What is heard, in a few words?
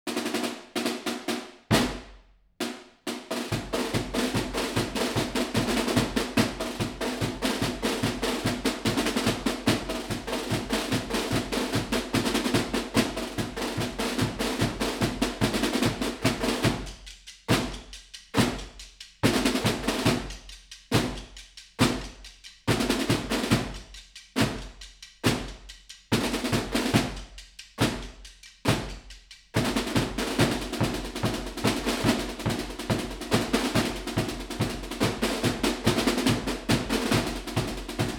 Drum Beating